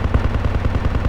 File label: polycraft loop echo